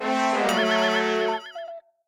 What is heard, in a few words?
Failure Fanfare